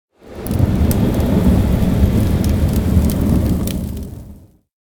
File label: burning hands, spells; 1st level spells; psfx